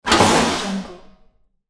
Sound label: medium metal crash